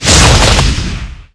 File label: Archer Skill; Seer; GamigoNA